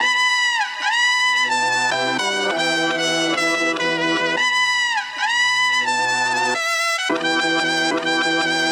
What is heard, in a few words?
Melody Loop BPM 110 B Min; Gully Loops